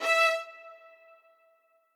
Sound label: string